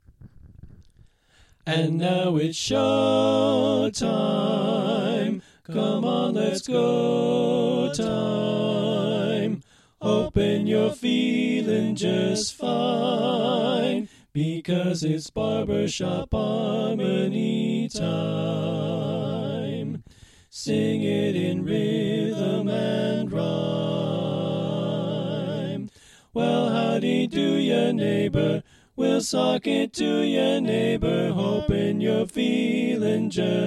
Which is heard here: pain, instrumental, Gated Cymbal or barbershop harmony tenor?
barbershop harmony tenor